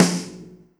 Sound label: Snare Soft